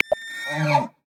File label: Elk, Polar Region; Xiaomi Redmi-Note-8-Pro